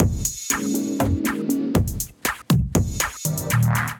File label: Kit, drums; Mad Mollusc Kit; Ableton; Skitter and Step